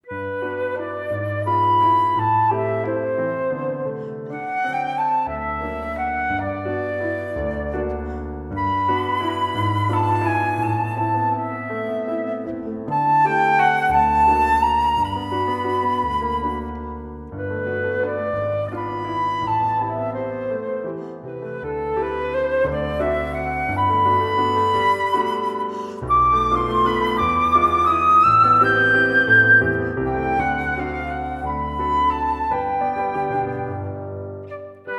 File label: valse; rousse fantaisie